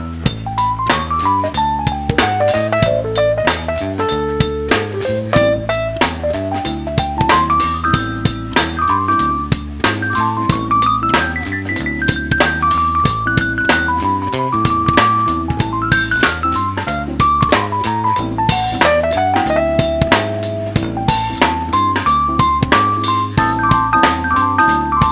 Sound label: keys